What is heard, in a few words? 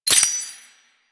Step Spur, Footsteps; Enemies; Foley; Warframe; Duviri; Dax